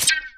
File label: attack layer; void spirit